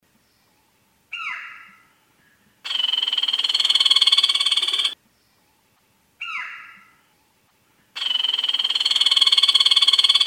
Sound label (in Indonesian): suara pelatuk ayam